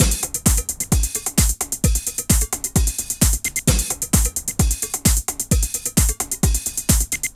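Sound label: drum loops, right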